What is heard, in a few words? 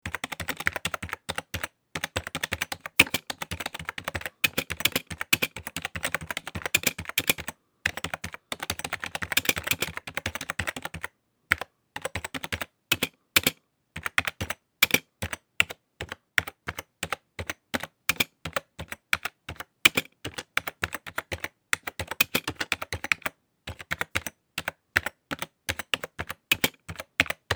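Computer keyboard Sound effect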